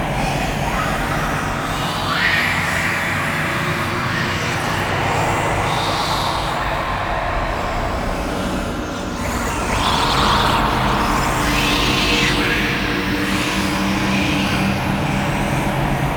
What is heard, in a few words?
Moon Whispers Loop; Warframe; Orokin Moon; Ambience